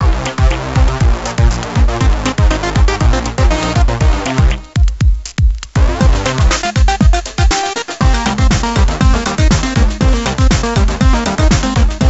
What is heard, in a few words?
Disco Style; Supermodel Makeover by Lauren Luke; NDS